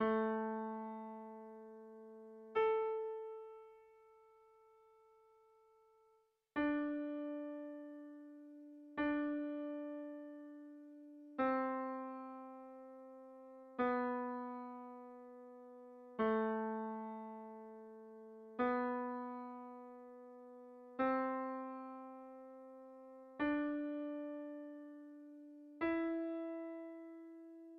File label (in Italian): Dettato Melodico